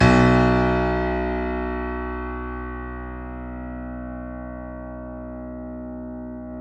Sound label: KEY B 0, YC7; Roland L-CD701